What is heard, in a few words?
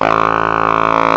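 BARITONE SAX, REEDS; CMI Sound Library Rev 1.3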